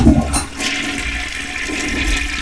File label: house toilet